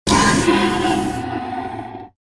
hydraulic door

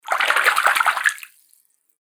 water splashing short

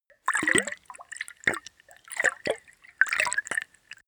bubble and splash

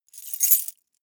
metal keys handling

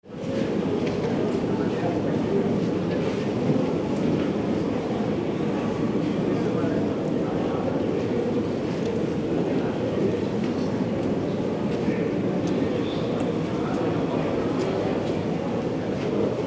subway station atmo people and steps

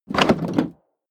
chest wood small open

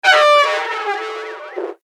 creatures imaginative

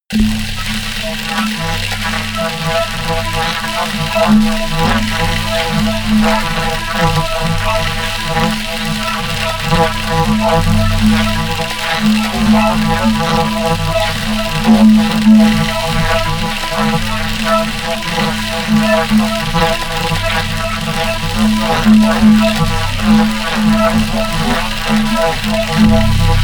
atmosphere crazy didgeridoo low

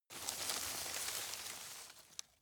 grab rustle medium

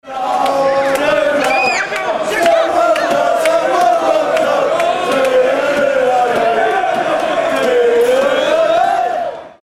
soccer fans celebrate uk

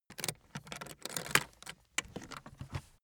aluminium case open close

